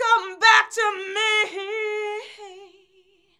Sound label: COME BACK, FEMALES, Hyper Dance